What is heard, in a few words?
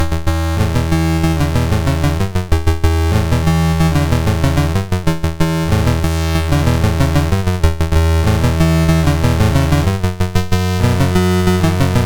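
Magicians Ball, MOS 6581 SID chip music, games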